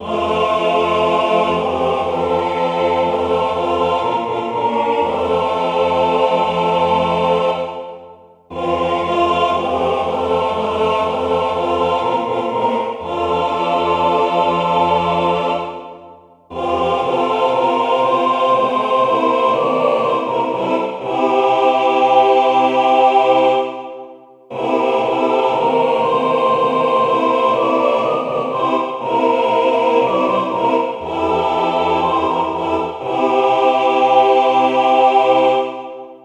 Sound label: drummer half